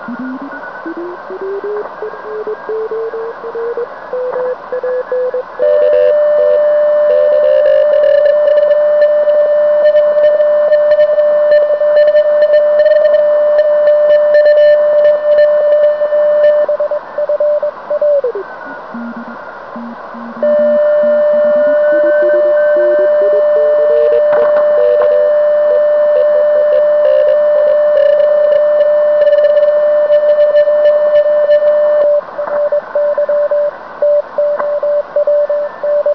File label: zerobeat